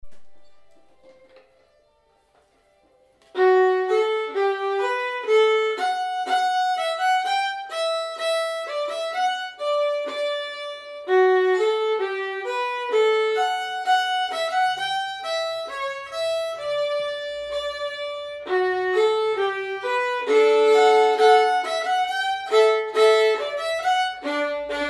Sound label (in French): polka du maire seul, violon